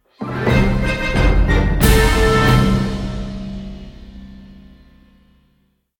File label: Big Orchestral Lose Fanfare